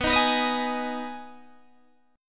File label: B 6th B down Guitar Open D